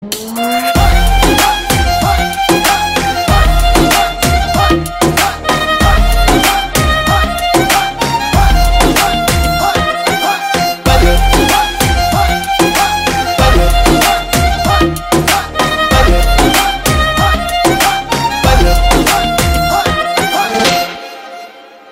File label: Song Instrumental